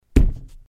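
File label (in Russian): удар по гипсокартонной стене боковой стороной кулака для создания стучащего звука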